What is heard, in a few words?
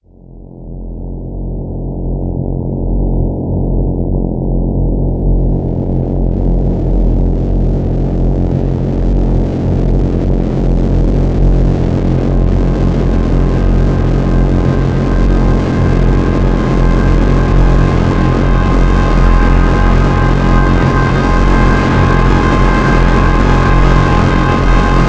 sonata left